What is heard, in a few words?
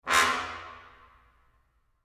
low mute short